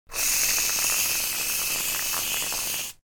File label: hair mousse